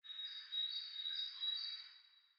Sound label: europe birds; ambient; War Thunder